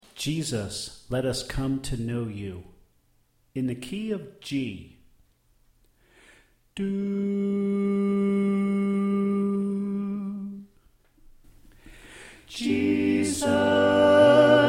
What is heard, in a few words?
Tenor Left